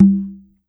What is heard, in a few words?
CONGA LO; E-MU Producer Series Vol. 7 – Old World Instruments